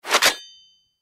change weapon

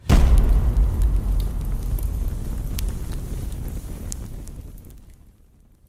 Mantis Shrimp Sun Punch

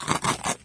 Zombie Crunch

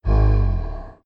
Bear Hmm

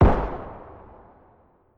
Pistol Shrimp Fire Bullet Underwater

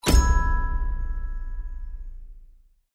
Rank Reveal

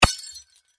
Bottle Break

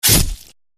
Stab In

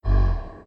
Bear Hmm

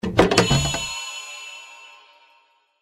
Chest Open

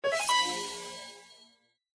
Spear Grow Up

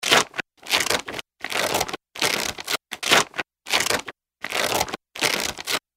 Bandage

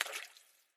Swim In Water Surface

